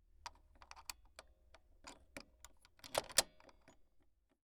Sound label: Steel Key Unlocks A Door